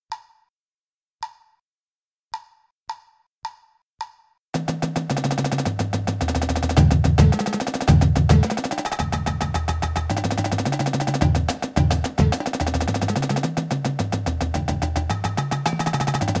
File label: duple rolls